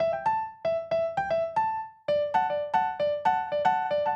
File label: Acoustic Piano